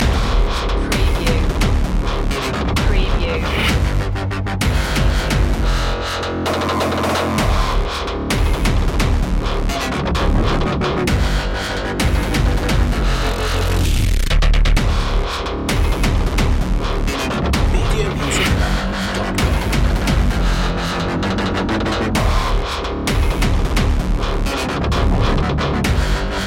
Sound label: hybrid orchestra loop